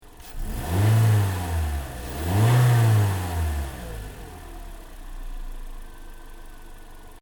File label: Car Toyota Corolla rev engine bay, hatchback; 2009 Toyota Corolla hatchback; Cars (Sound Effects:Vehicles)